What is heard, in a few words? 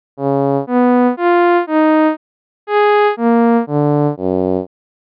Envelope Sustained